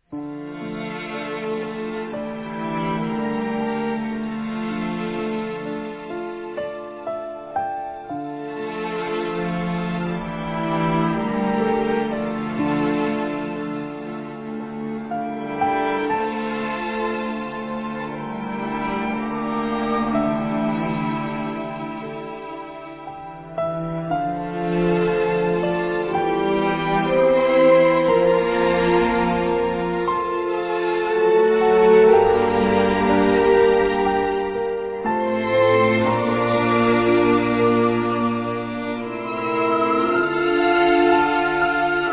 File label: Reflections orchestral